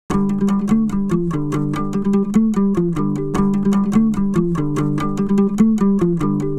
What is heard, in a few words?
molo 148BPM mono